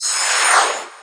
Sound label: materialize